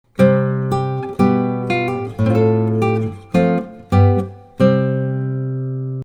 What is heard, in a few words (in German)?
gitarre